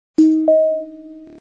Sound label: two tones